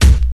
Good Steel Kick Drum Sample B Key, Royality free kick sound tuned to the B note.; 80s